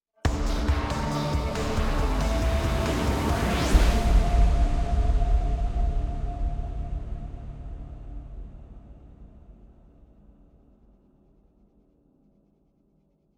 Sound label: Desert Music, Sandstorm Mix Outro